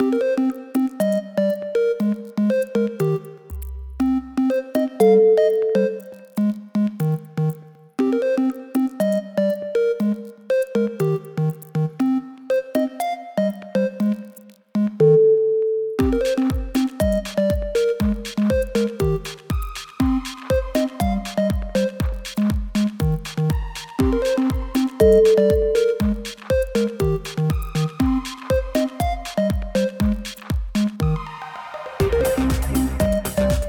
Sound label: minimal glitch